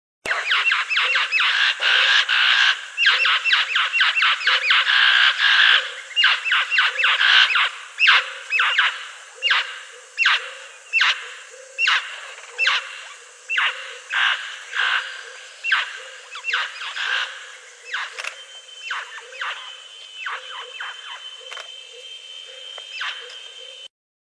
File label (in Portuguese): canto do papagaio dos garbes